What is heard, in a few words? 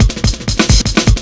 ACOUSTIC, Big Beat Drumloops; USB Soundscan vol.25; AKAI